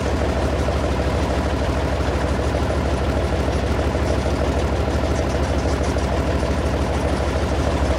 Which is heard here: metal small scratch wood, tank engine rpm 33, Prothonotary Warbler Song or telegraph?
tank engine rpm 33